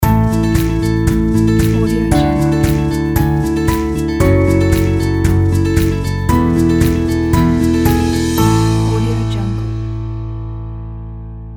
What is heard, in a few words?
happy guitar intro ident